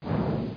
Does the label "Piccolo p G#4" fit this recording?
No